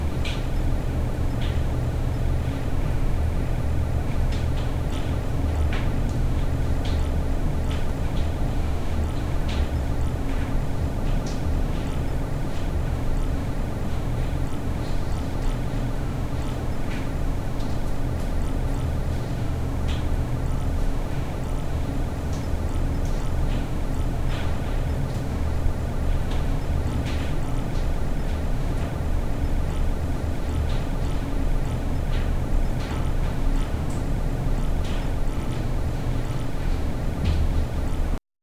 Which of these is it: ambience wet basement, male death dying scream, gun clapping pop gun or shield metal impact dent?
ambience wet basement